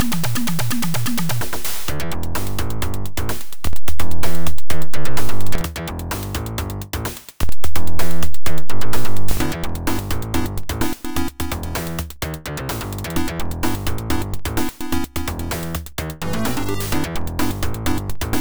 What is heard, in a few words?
Fighting - Character Selection; Adventure Tsukuru II; PC-98